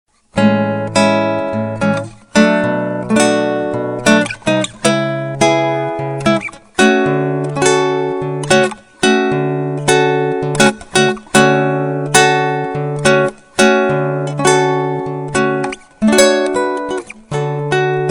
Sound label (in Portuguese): Violao